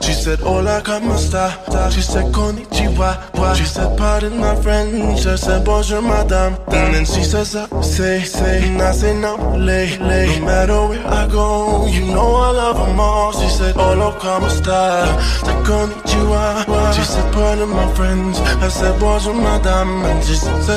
english song ringtone

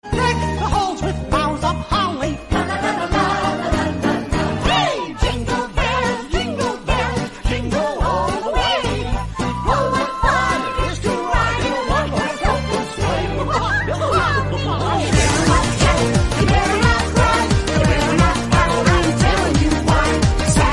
christmas funny

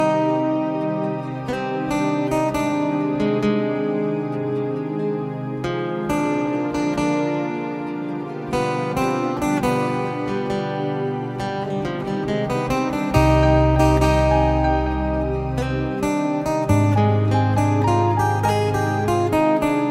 ringtone bollywood ringtones

